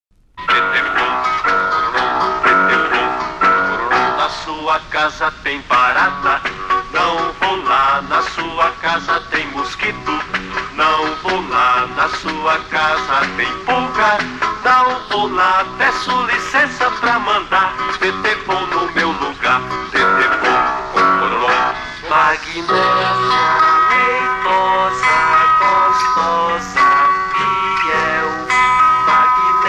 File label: jingle; detefon